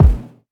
round steel kick drum a key, Royality free bass drum tuned to the A note; kick drum samples